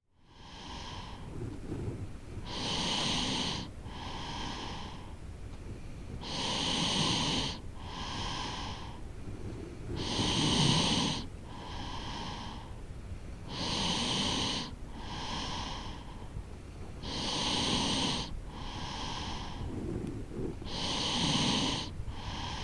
musume no neiki; Chino Cultural Complex

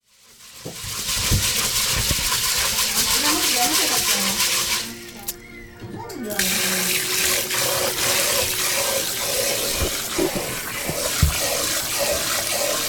お米をとぐ音; okome wo togu

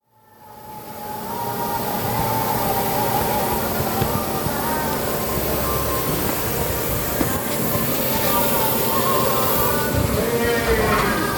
ガソリンスタンド給油時の音

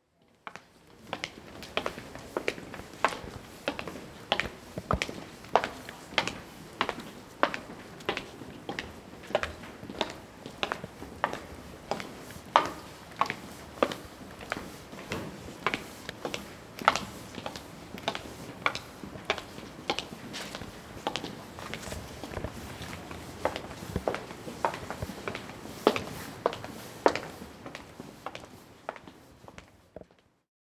ashioto

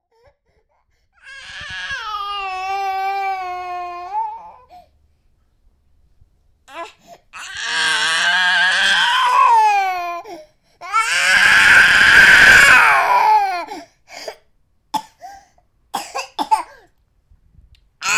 mago no nakigoe; 孫の泣き声

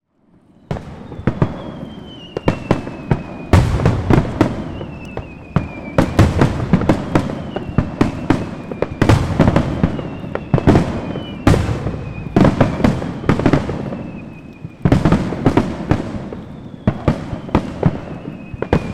uchiage hanabi chokkin; Chino Cultural Complex

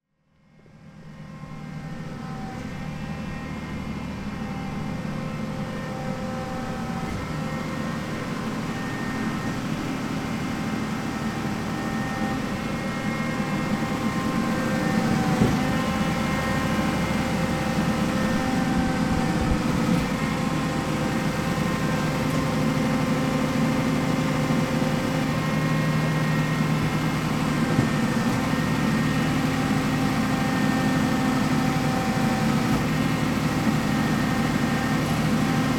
gomi shuushuu; kikushima; Chino Cultural Complex ARCHIVES

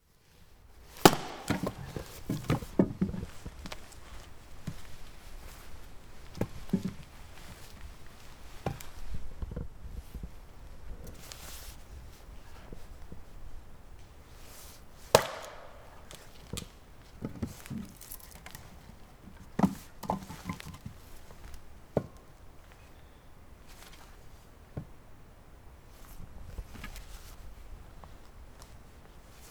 bansyu no mori

